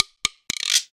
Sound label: Guiro 124bpm